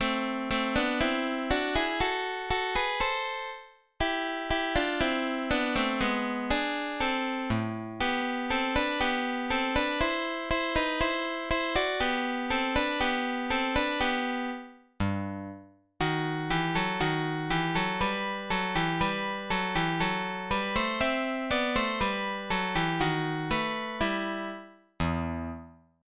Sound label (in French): glisse; Ernest Shand